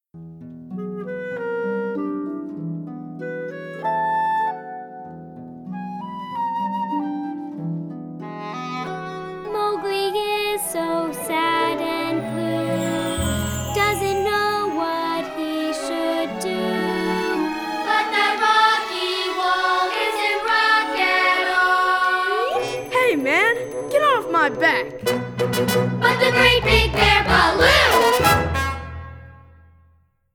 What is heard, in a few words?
vocals; baloo the bear